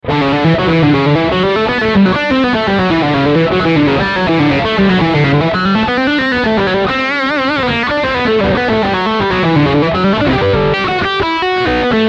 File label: Single Note Humbucker